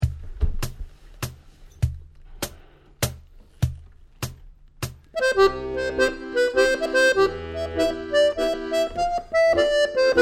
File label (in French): Mazurka d'Auvergne; Une mazurka joué normalement en Do mineur; à jouer de l'Auvergnat à la trompette